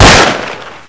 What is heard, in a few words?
colt fire